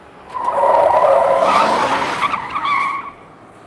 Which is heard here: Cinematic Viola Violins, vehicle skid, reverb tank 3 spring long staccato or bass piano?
vehicle skid